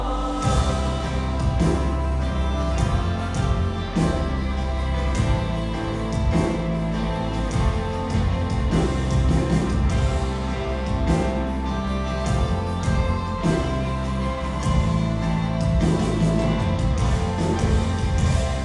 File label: rhythm